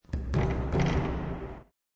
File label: Basketball Hit Rim, Basketball bounces off rim; Sports